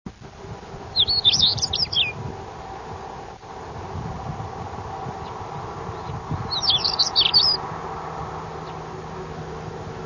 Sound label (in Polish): kląskawka - nieco inny śpiew; wroblowe; turdidae